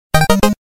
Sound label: Duck